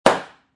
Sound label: hit rock